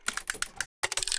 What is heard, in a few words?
music Box begin